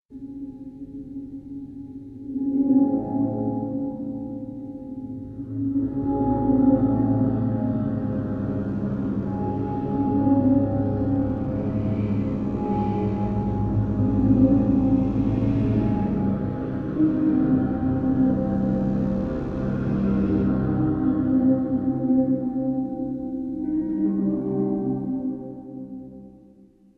textures sunken temple; NI arkhis

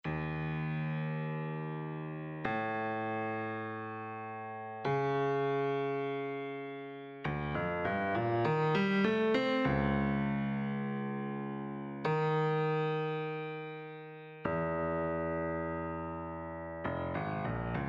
grand piano arp

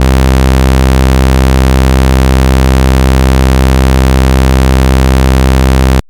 SH-101 Saw; Roland Cloud

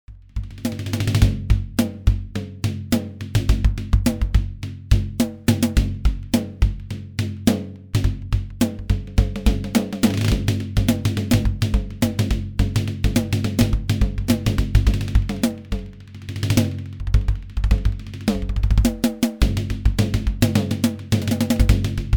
Drums; ND Dyno

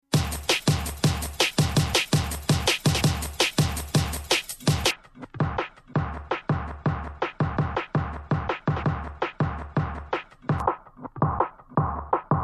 Filtered Loop

